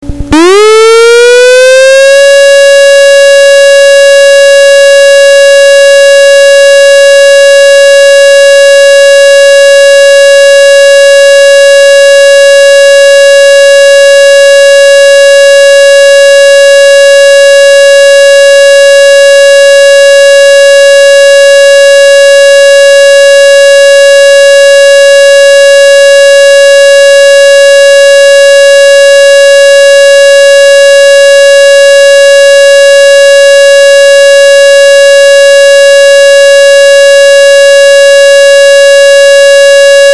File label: flat Siren; 사이렌음: 평탄음 1분; 활용사례 : 현충일 추념식 등; 민방위 경보; 기타 사이렌음; 「민방위 경보발령·전달 규정」제18조(민방위사태외의 사이렌장비 활용); 중앙민방위경보통제센터